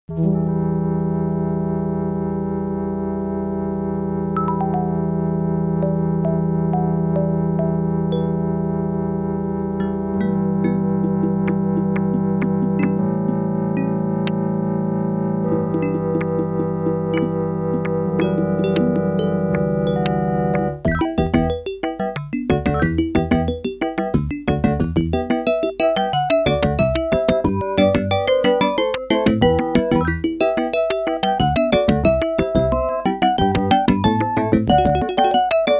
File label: adlib; monkey